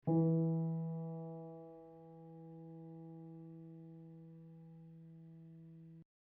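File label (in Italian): Mi2 new, Replica di martelli Pleyel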